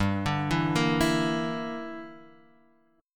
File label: G Minor 6th, Guitar Standard; Gm6 arpeggiated; JGuitar